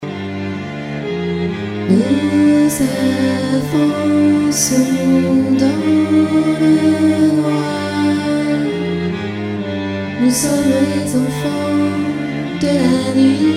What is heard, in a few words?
alto